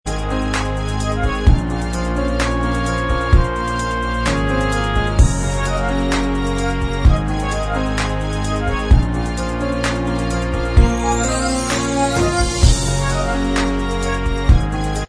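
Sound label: instrumental, backing track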